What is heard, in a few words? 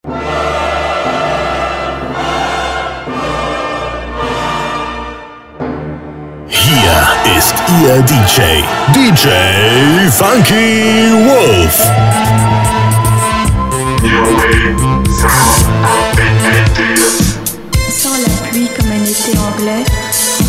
popwave 80s mix